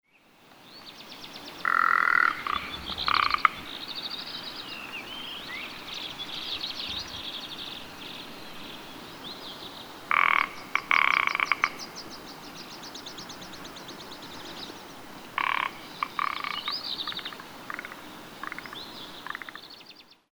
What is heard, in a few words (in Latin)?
Lagopus mutus